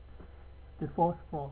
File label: floor